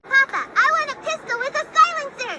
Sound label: pistol; anya